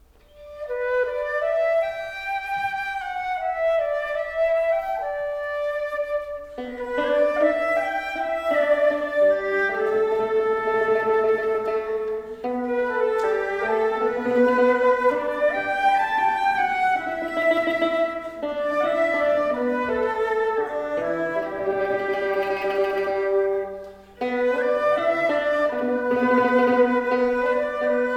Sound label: trio folkmusic prelude